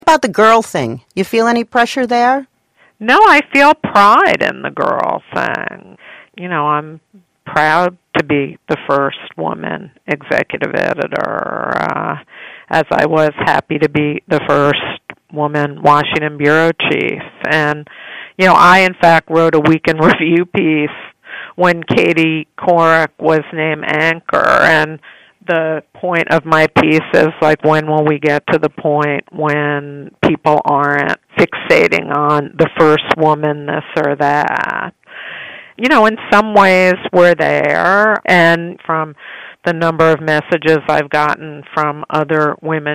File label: more humming